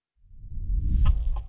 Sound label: Boot Sequence; BGM; Altdeus Beyond Chronos